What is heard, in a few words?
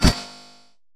gem drop